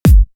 808s

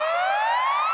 siren

